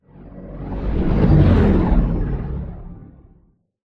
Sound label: deploy from mega; Titanfall 2